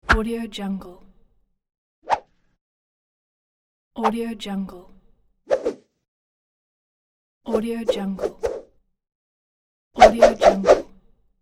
arm whoosh quick

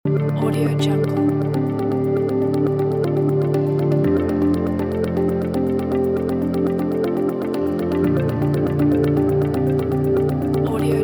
future timelapse; qubesounds

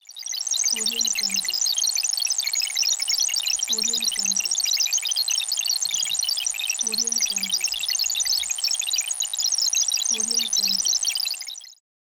computer calculating

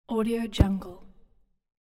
flash

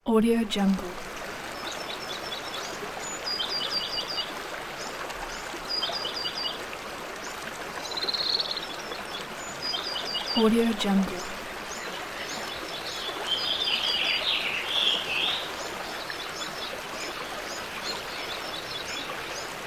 birds lake

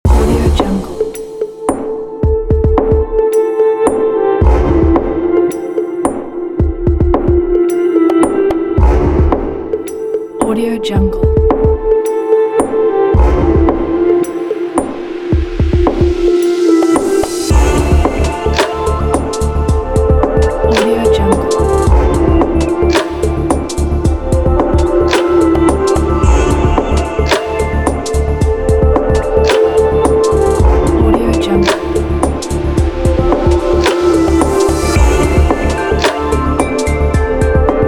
tech chill kit; bransboynd

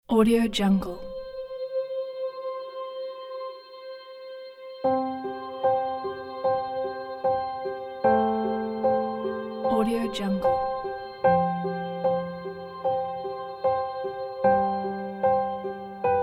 emotional inspiring piano strings; lionmusicstudio

